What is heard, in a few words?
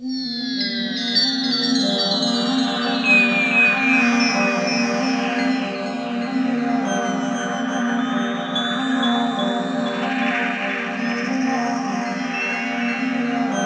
SYN JD800; Best Service Gigapack 1